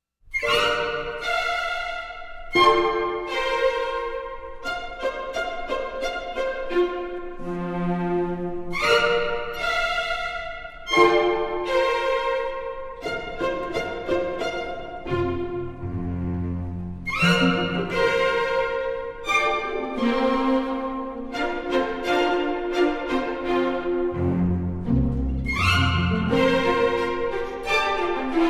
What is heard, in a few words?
Tip Toe Waltz; Wolfs Rain